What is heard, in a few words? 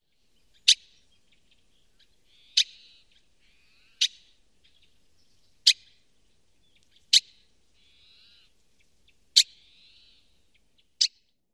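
call, California Thrasher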